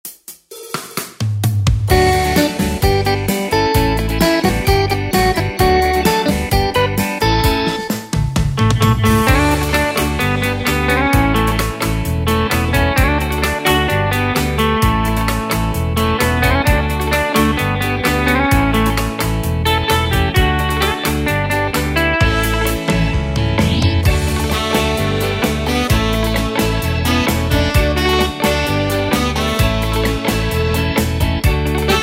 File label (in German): 60s Schlager Oldie